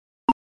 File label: clock